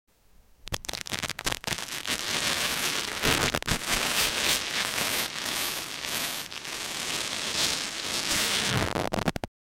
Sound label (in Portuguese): mexendo nas fitas cassete, rubbing